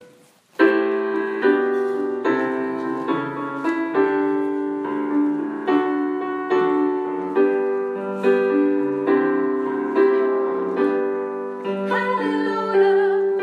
halleluja alt

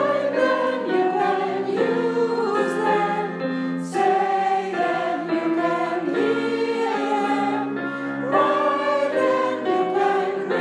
sopran og tenor

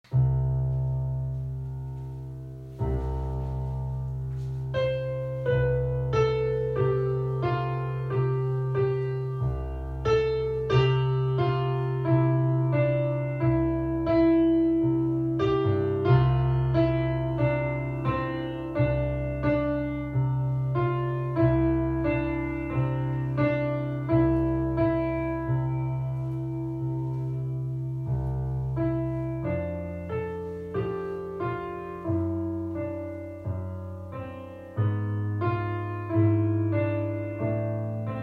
aftenstunden angst klaver

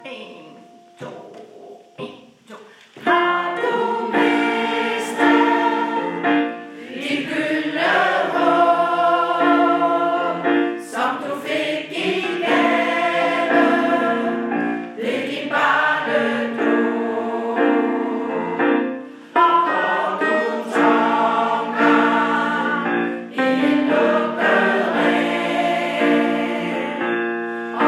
sirensangen hele sangen

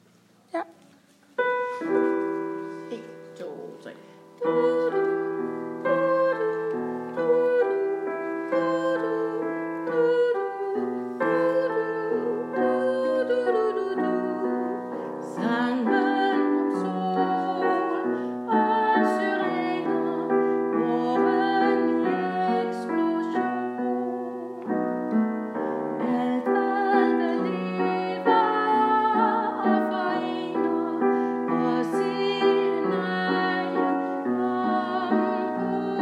vårvise sopran

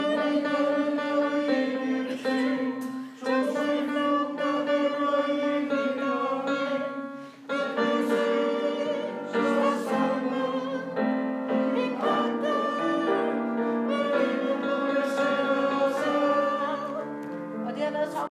pagten omkvæd tenor

